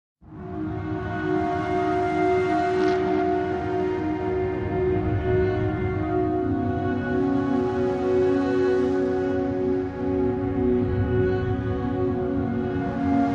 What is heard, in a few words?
Soft Pads